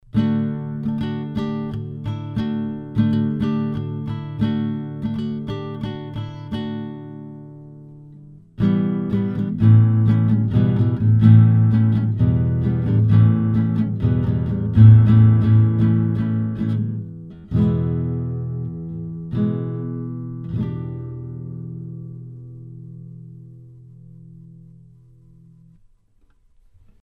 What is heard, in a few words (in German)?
tbone rb770 gitarre